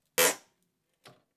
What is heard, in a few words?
Nail Pulled; Construction; BBC Sound Effects Library